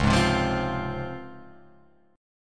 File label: C sharp Major 7th C sharp Guitar Open F; C#M7; strummed